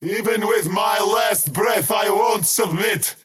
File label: skywrath crown deny pain; skywrath mage